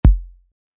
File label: Kick